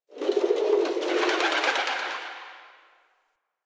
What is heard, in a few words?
Gate Bird, Ambience; Ostron; Warframe; Eidolon Plains; One Offs